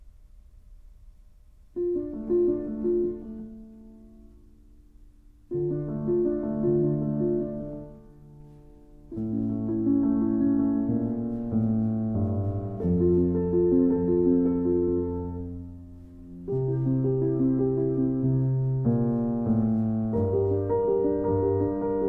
Sarabande Piano Meditation, Suite in D Minor; After Sarabande from Suite in D Minor, HWV 437

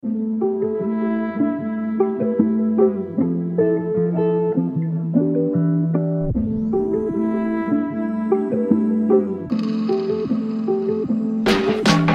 Walking By, lofi beats

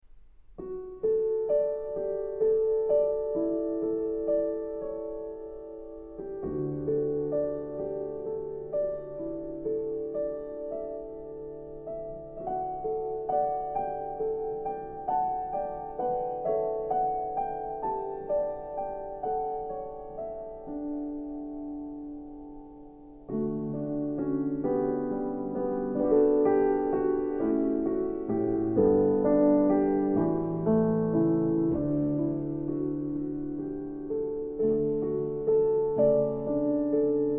Grand Piano

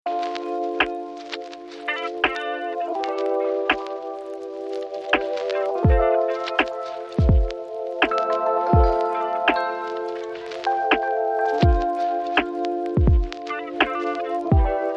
jeep, Chill Instrumental Beats

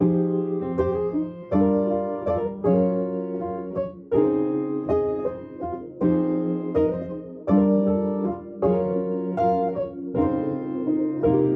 rebundance, lofi piano

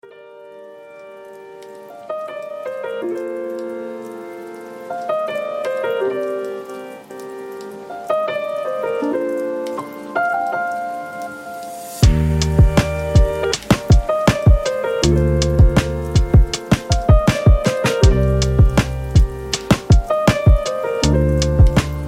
clavia, lofi piano

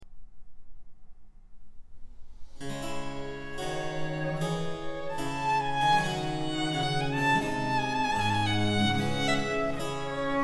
Violin Sonata in D Minor, Adagio

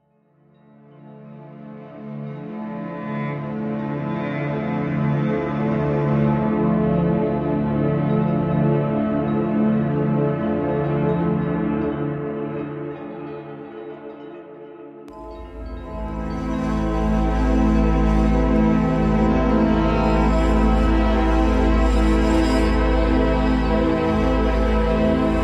432hz No Ambience

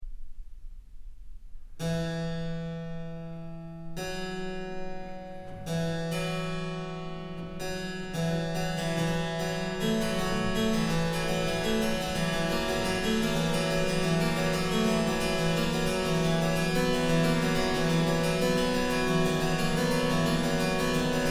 Harpsichord; Anklänge. Six Pieces for Harpsichord; Sempre legatissimo

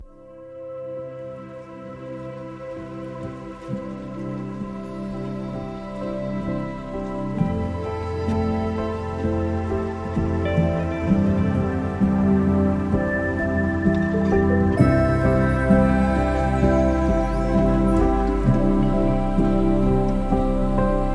Lo-Fi

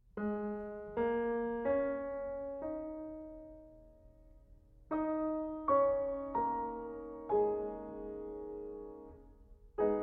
2 Pianos; Gershwin; Arr. Firth for 2 Pianos; Girl Crazy

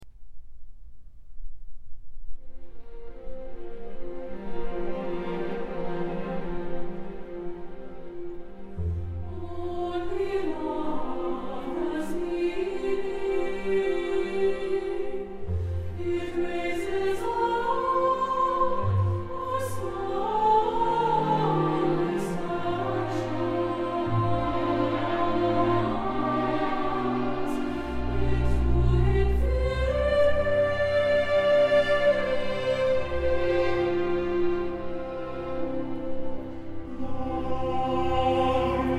Sung in English

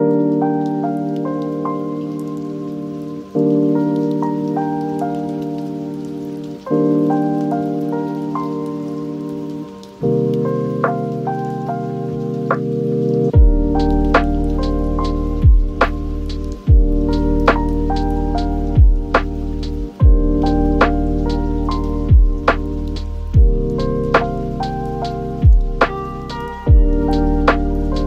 lofi piano

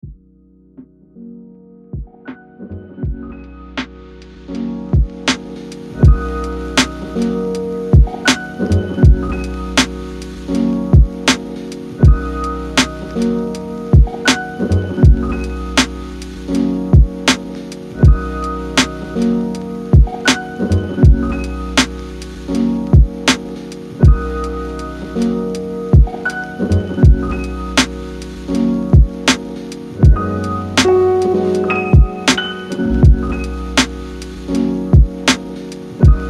lofi beats

